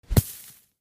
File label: snowball